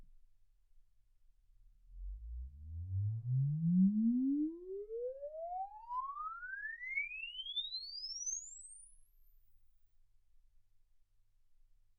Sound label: exp swept sinus 10Hz 24kHz